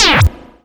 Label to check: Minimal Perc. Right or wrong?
Right